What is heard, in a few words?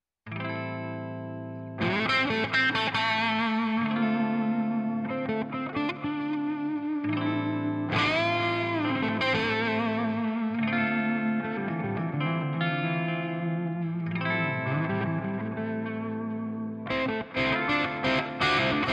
Blues Clip using Les Paul and Voodoo Hex Amp